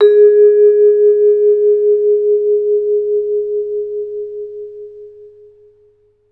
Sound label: VB VIFS G#3, orchestra; Advanced Orchestra